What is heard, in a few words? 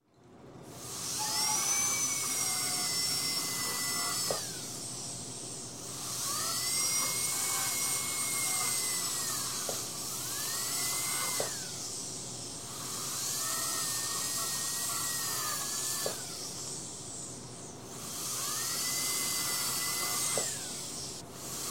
Dentist, Drill Drilling Patient's Tooth; Sound Ideas 2000 Series